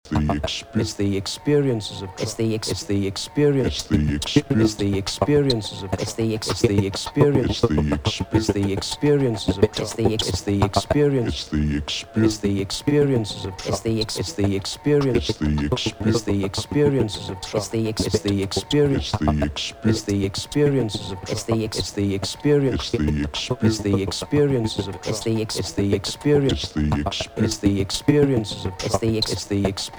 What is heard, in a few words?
Trance